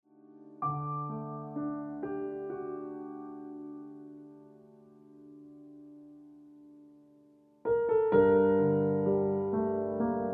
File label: Ringtone Piano Music